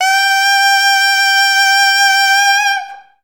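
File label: oboe G4; Best Service; Peter Siedlaczek's Advanced Orchestra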